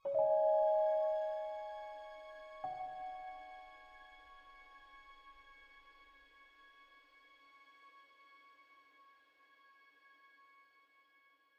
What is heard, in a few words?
Suspense Short; Pillars of Eternity